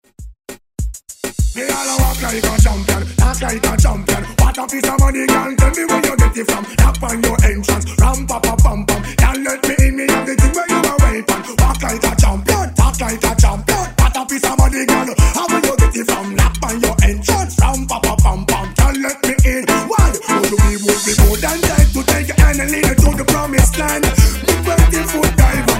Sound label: Afro Moombah Redrum